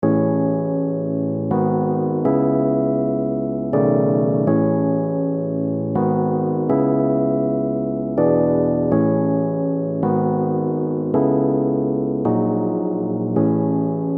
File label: piano chords; Piano & Keys; Crowdsourced Sample Pack